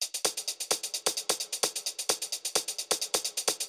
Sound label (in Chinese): 紧张鼓点; 战中; nba